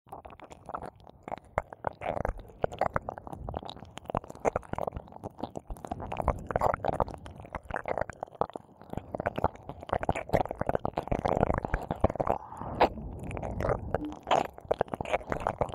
両耳舐めループ; 通常耳舐めver；おまけ

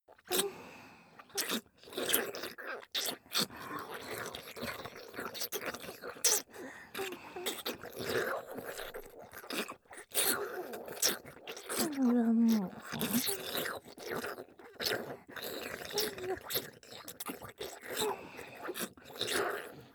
ひたすら ベロチュー＆フェラ ループ

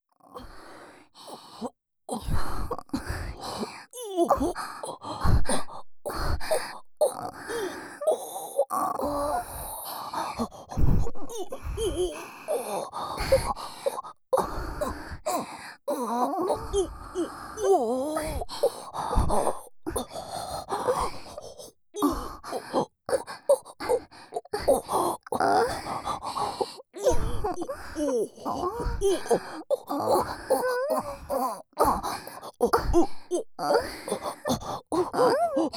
両耳嘘喘ぎ、オホ声 (左右反転); ASMR

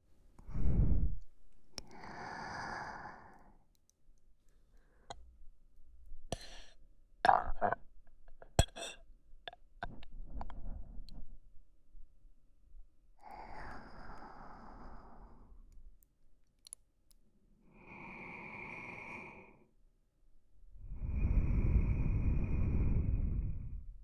寝息×クチュクチュ×げっぷ

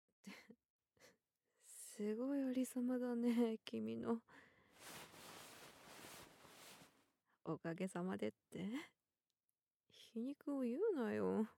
お掃除 フェラ 切り抜き, 効果音 入り

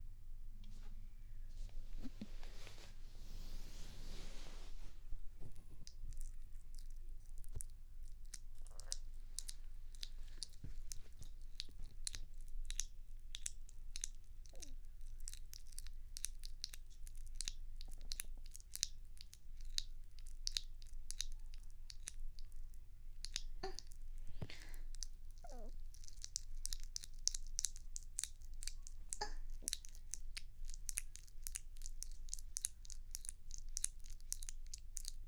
くちゅ音 バイノーラル

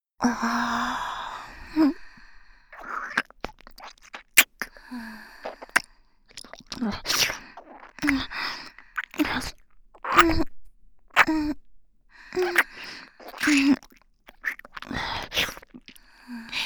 舐め+吐息ループ, ASMR; 触手

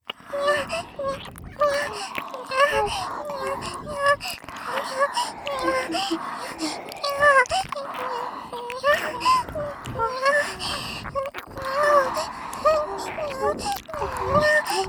キス＋ロリ喘ぎ＋両耳舐めループ（通常ver）

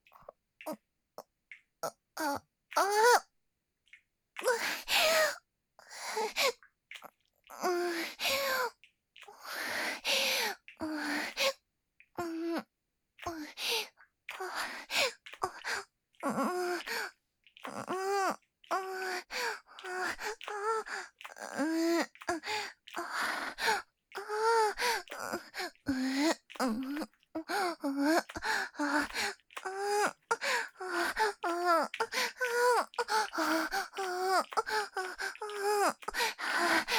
喘ぎ（SEあり）喘息

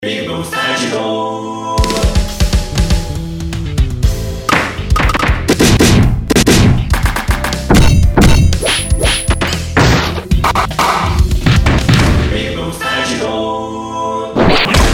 戦闘武器 鈍器; ASMR; 効果音; ぴぐみょん効果音素材集; ぴぐみょんスタジオ

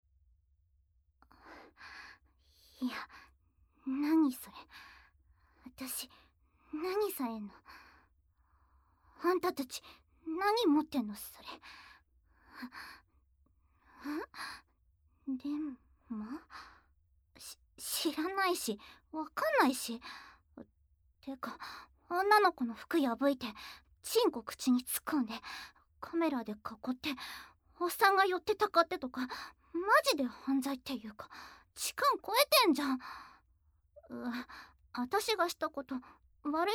バイブ責め

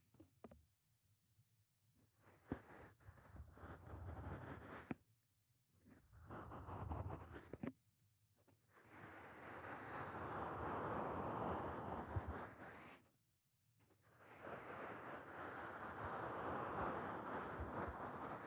パウダーマッサージ, ASMR

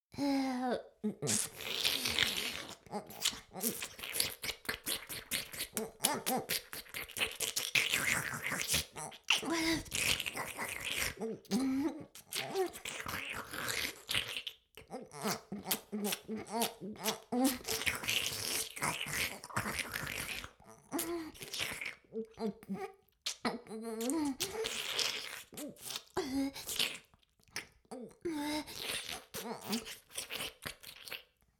フェラ音声; 魔法少女マスカリマッスル

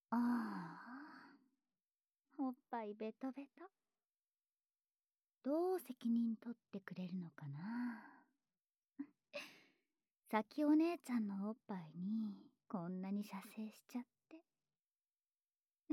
フェラチオ; SEあり

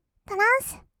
トランス; 1200 kawaii voice!!

